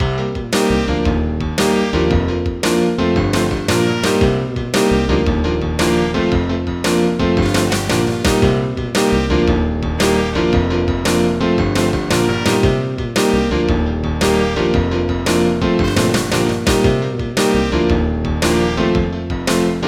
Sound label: pa frantic, midi